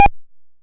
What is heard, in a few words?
Apple II FX Beep, Chiptune